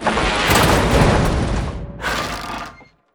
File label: Flying Machine Death